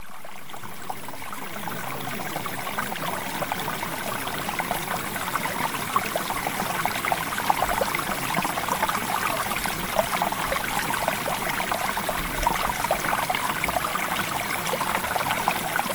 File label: Water; Premium Subliminals